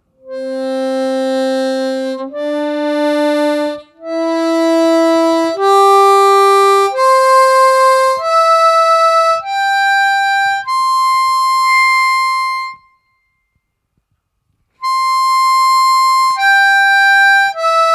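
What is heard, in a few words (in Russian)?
перемещение по октавам, губной гармошке; Играть все октавы подряд +(14) -(14) +(25) +(36) +(47) +(58) +(69) +(7 10) и в обратном порядке.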